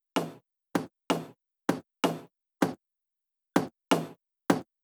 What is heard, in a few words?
Tom Loop, Drum Loop 128BPM; VEC5 Drumloops; VEC5 Multi Loops; Loop Elements